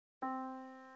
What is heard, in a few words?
yamaha Disk